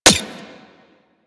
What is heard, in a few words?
Rifle Mech; Weapons; Warframe; Corpus; Crp Ruban Rifle